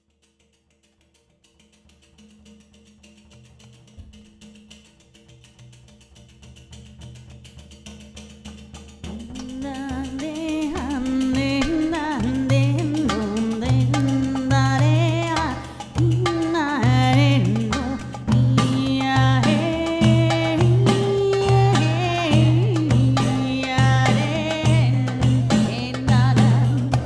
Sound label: joik